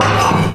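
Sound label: metal frame scrape heavy; Source Engine; Portal 2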